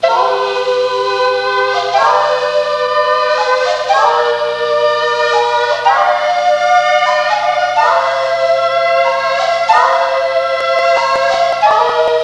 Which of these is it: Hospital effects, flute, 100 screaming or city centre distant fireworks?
flute